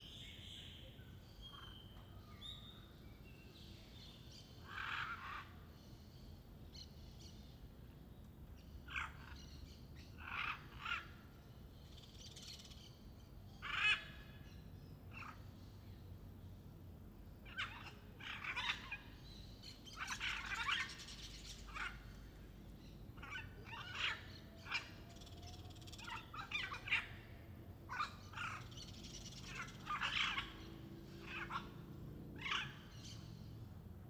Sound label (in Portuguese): Arara e outros passaros no ifg goiania a tarde ambiente reverberante, gril; aves